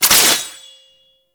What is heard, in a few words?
Brood Impact Launch; Warframe; Oraxia